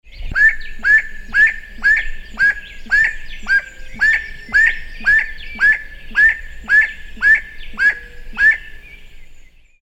Aramides saracura; Ocampos

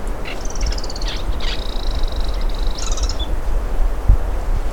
Asthenes baeri

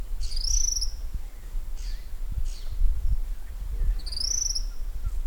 Tyrannus melancholicus

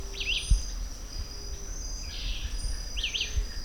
Vireo olivaceus; yacyreta

